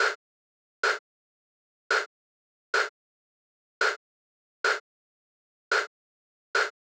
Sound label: 126BPM Cutted; Vengeance Samples; Lineup Kit